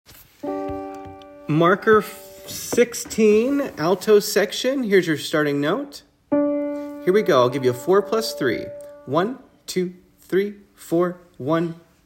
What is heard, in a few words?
Alto, choir